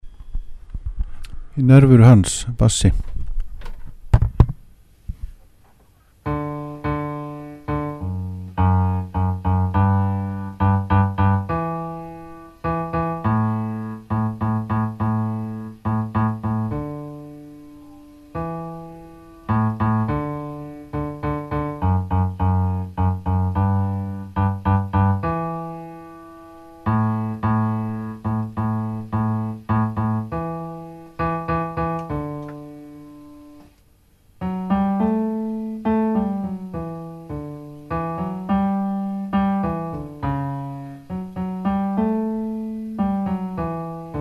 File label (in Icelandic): bassi